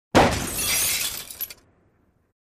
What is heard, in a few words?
Crash Metal Glass